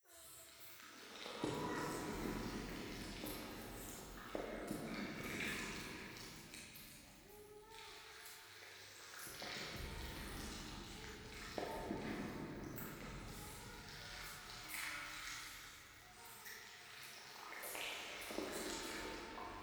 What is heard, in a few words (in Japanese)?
教会 イラマチオ 触手